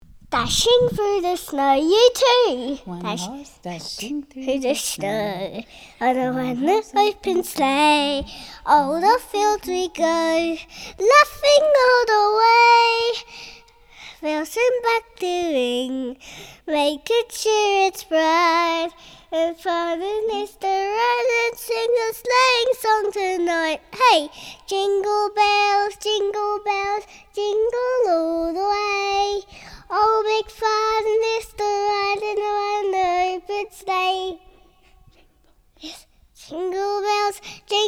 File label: jingle bells